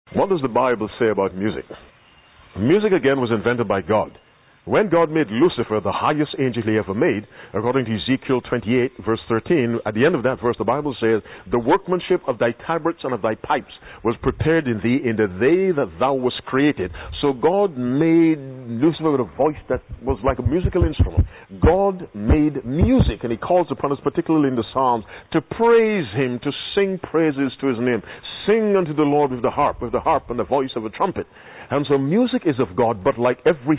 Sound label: Music